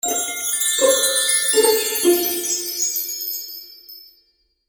Magical Music Finale, Cartoon Music Sound Effects

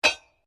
SLEDGEHAMMER METAL IMPACT HEAVY

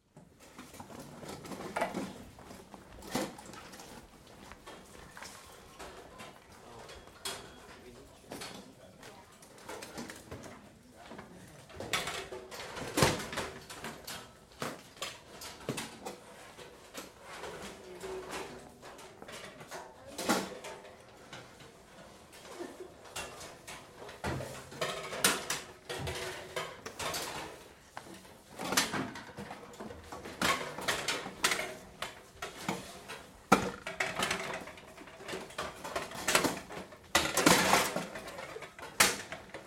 Activity Class Setup Music Stands; Schools; BigRoomSound

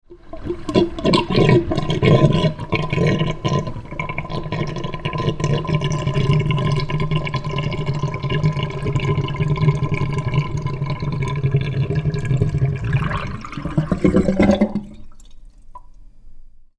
Water Gurgling down Sink Waste hole; Water and air glugging down waste trap; Sewer

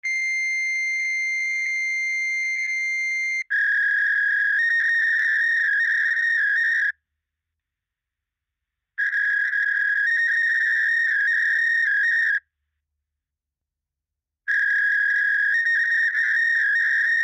Fax Machine Receive, Fax Machine Receive Tones; Electronics; Office; Fax Machines; Blastwave

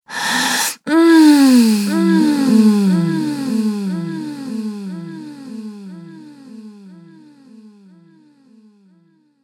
Sex Groaning Woman With Delay

